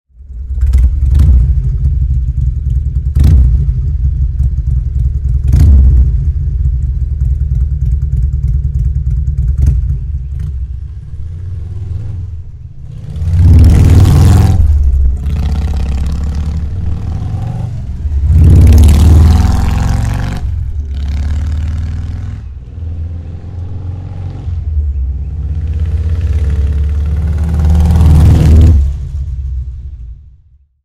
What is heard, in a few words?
slip ons deep growl; touring models; bomber